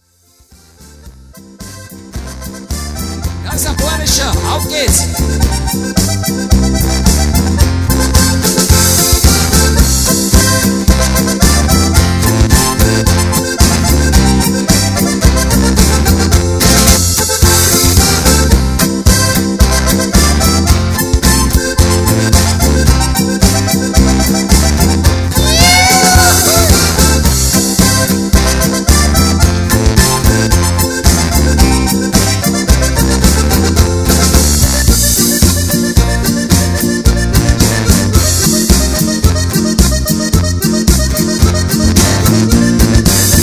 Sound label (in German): Timple Boarischer